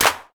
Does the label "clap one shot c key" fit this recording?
Yes